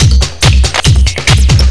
Electronic Loop; Guitar&Electro

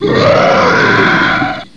monster

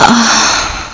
orgasmic lady

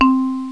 Marimba (C3); X-Wing Demo

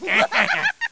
Both Laugh; Bible Fun Pack; Noah

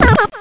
bonus; Amiga

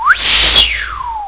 WHEE

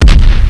Shoot Sound; 3-D Cannon

